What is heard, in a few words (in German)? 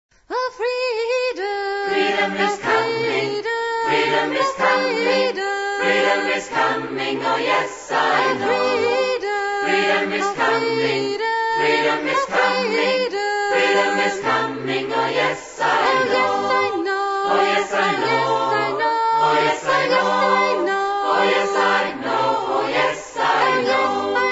Chor; Joy Der Chor aus Hohenems